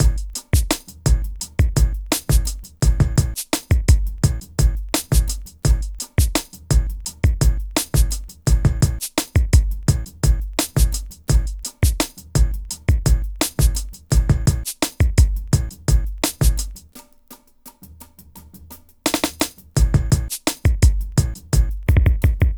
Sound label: Drums, Hip Hop